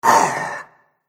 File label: Zombie - Rotten First Mate; horror; Zomby